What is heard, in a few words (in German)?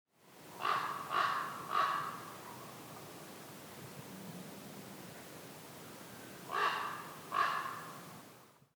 Kolkrabe entfernt